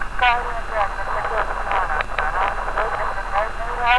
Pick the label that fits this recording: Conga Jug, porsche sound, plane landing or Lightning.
Lightning